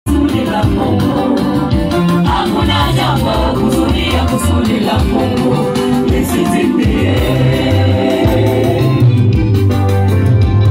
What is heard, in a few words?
Gospel Choir